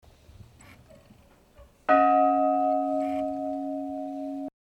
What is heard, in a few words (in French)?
cloche; Savennes